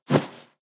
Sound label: cbar miss